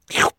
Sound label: slerp